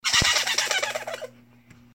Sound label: Annoying elephant